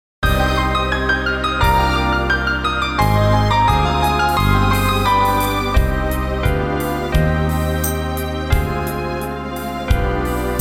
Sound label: solo piano